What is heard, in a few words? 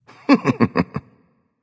laugh; smite; susano